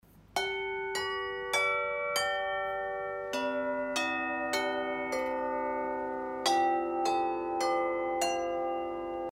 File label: Harmony Bells Major Bunch; Red Green Blue